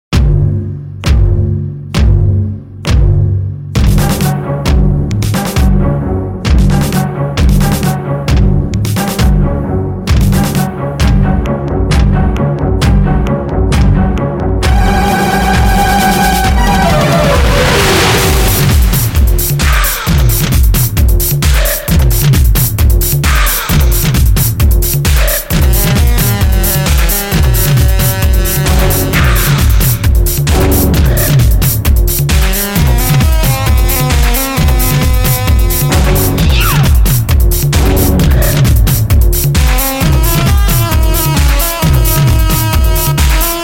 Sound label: Dance Music (Instrumental); Sultanat